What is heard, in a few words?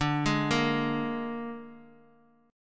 Ab Suspended 2nd Flat 5th D, chord